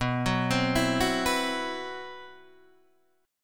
C Major 7th Flat 5th B

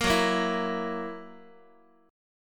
Gb 7th Gb down Guitar, chord; x,x,1,4,2,3; Listen to Gb7 strummed

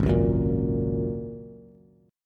Ab 7th Suspended 2nd Suspended 4th, Bass; Ab7sus2sus4 strummed; 4,4,4,3

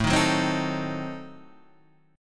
A Diminished 7th A, Guitar Standard; Adim7 strummed; JGuitar

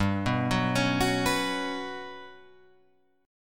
G Major Sharp 11th G down Guitar Open F; arpeggiated; JGuitar

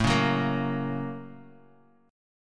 Bb Minor Major 7th Sharp 5th

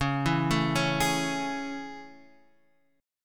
A Major 7th C sharp

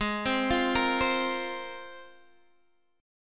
G sharp 6th Add 9th, Guitar, Open D

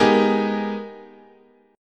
G sharp Minor Major 9th, chord

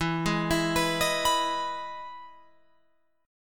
E Major 7th E down Guitar Open F; EM7 arpeggiated

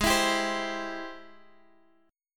G sharp Augmented Major 7th, Guitar, Standard; strummed; x,x,6,9,8,8; JGuitar

